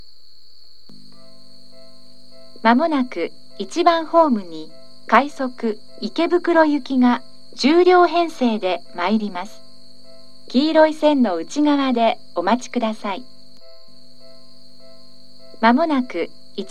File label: bushi rapid ikebukuro, seibu station